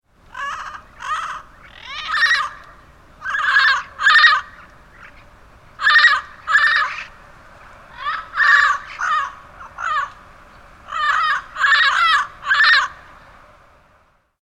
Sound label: yellow headed parrot, pasadena